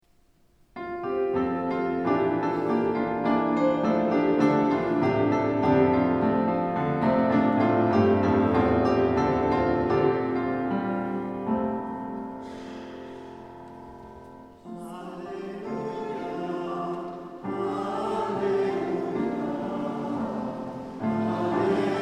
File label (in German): Halleluja Vers Halleluja